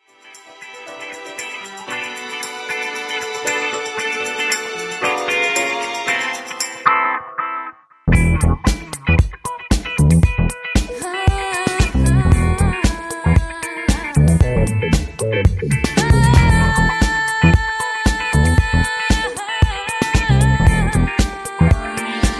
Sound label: New Groove